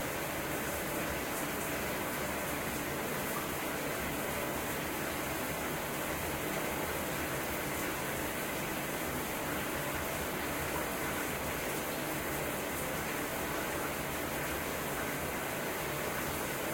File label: shower Muffled Loop